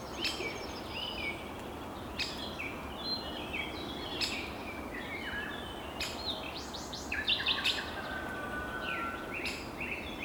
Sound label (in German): Vogelstimme Buntspecht Great Spotted Woodpecker Dendrocopos major; Vogelstimmen